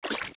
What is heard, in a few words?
Steps Shallow Water Jump; Zelda; OOT